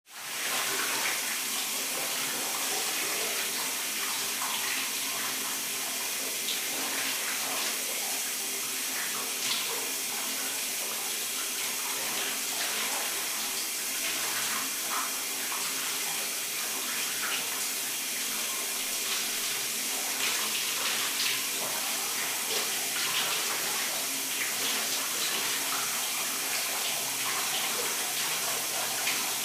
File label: shower